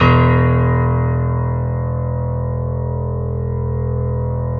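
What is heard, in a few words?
piano, grand; Sound & Vision; Gigapack I CD 2; PIA GRAND 1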